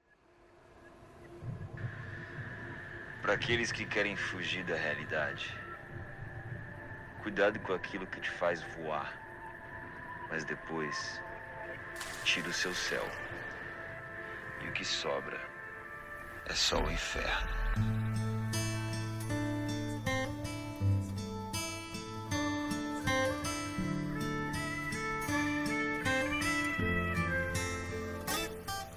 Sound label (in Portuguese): graves bass